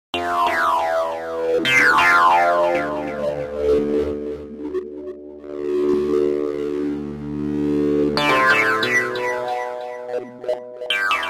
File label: synth fx